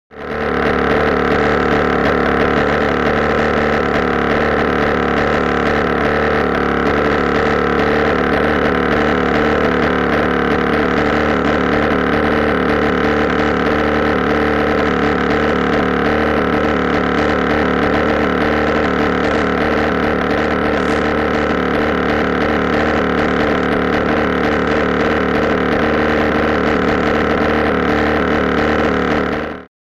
Gyro; Start / Idle; Auto Gyro Start Up And Long Motor I; Auto Gyro Start Up And Long Motor Idling